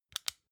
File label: flashlight off fast